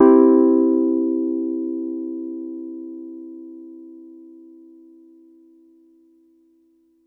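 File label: Piano Wurl major med; TrashLibrary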